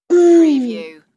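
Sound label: Infant Hmm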